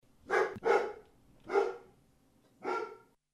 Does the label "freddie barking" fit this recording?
Yes